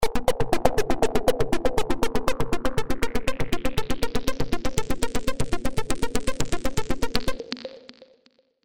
asynchronous ricochet

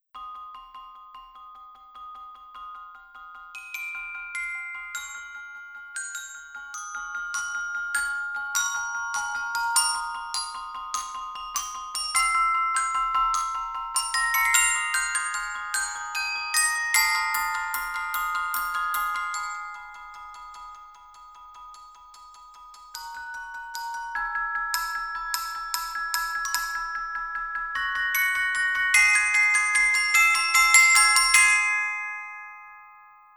glockenspiel

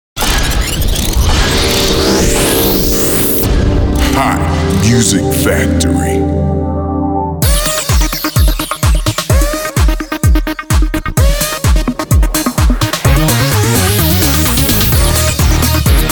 funky rock house

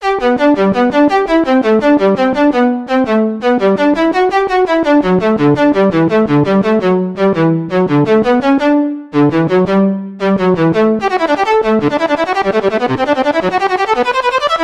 violin quartet

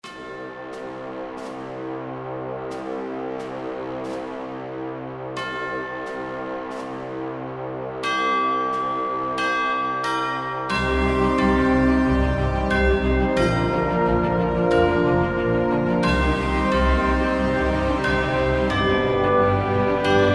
bells

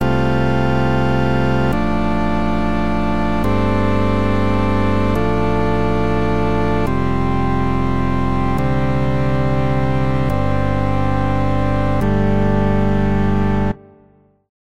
telharmonium dynamophone

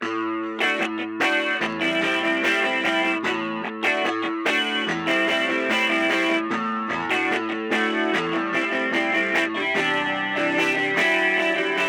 guitar the chorus

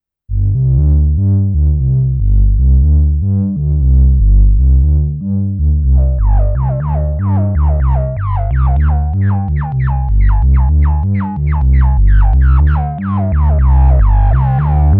digi elephant

